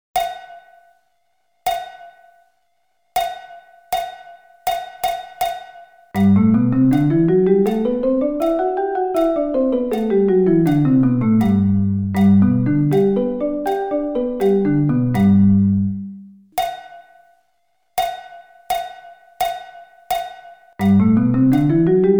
G Scale

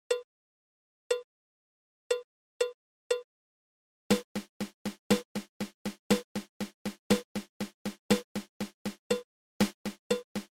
Single Paradiddle

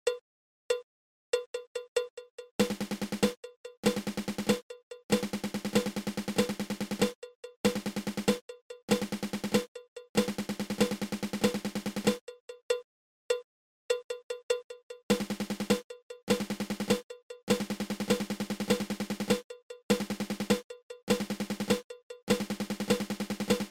Flam Paradiddle diddle

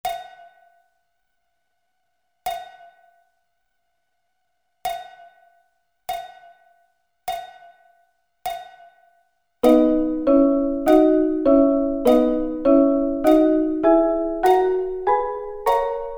B Double Stops

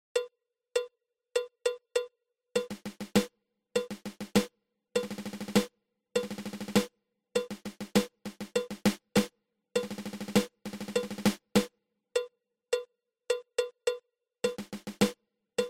Nine Stroke Roll

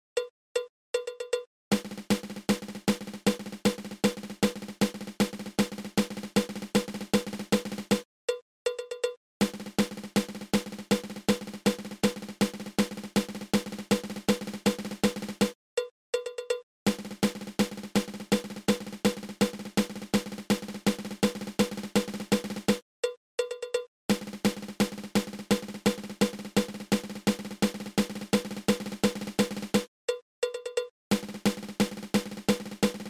Single Drag Tap triple

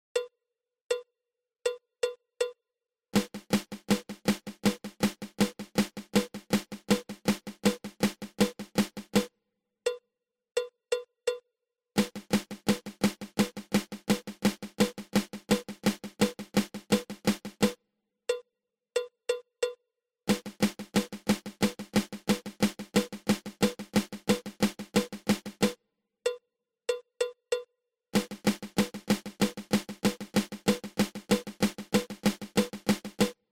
Inverted Flam Tap

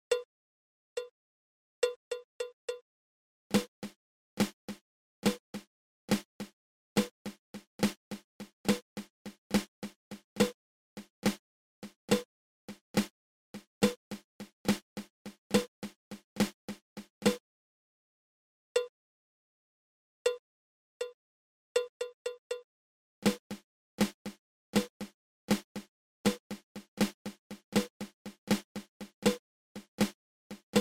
Swiss Army Triplet

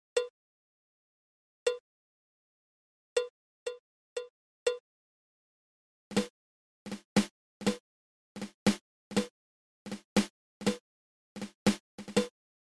Double Drag Tap

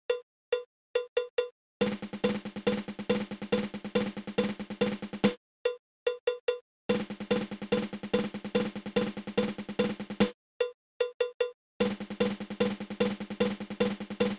Single Dragadiddle

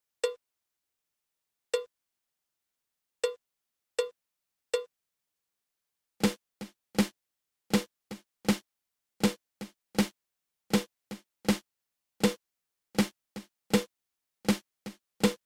Inverted Flam Tap

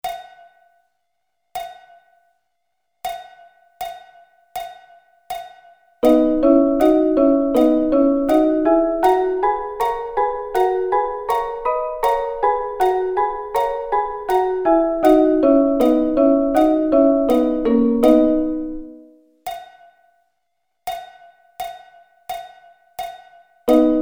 B Double Stops

